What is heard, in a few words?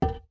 Pot Drop; Dropping a pot (2 pitches); The Wind Waker; Zelda